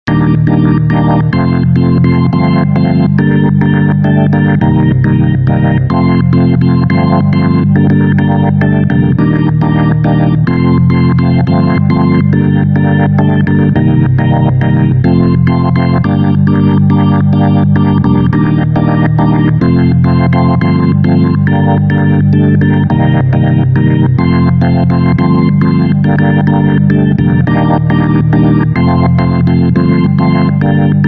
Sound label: organ; HALion6 : Model C; Como Va